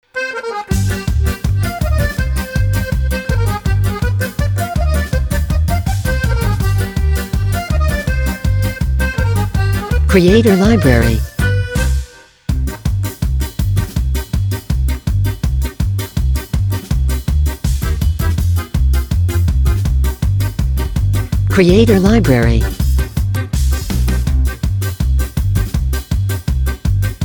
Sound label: Bella Ciao, Folk Acoustic